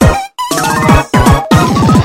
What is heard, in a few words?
pre minigame; tf; microtf2; orbulon